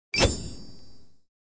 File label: Bright positive transition